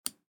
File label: mouse up